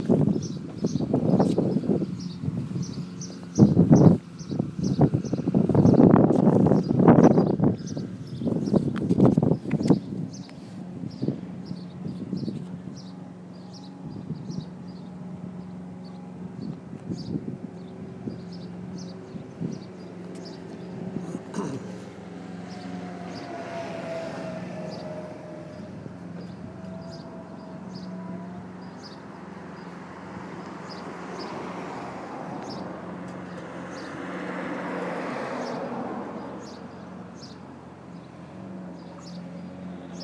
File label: village noises